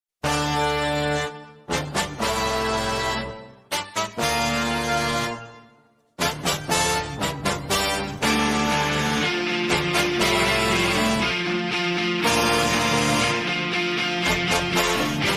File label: tamil mass bgm ringtone; mahaan